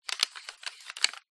household led lantern lamp handle movements

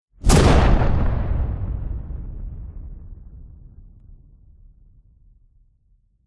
explosion punchy med large powerful

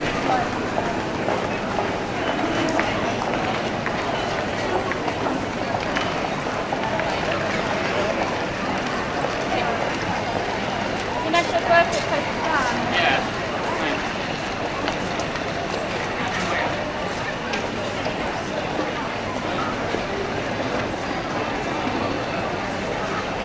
ambience airport departure lounge; stansted

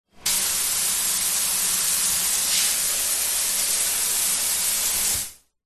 household shower run off

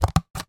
office scissors cut credit card in half; sound effects one

